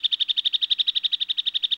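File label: Chirpy Radar Computer, beeps & tones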